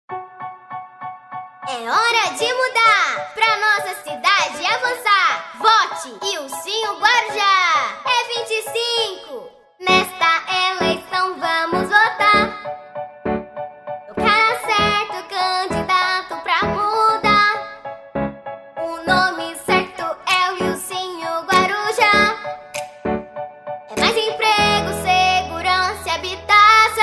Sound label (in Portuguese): jingle politico; Para Prefeito E Vereadores; Voz Marcante; studio lako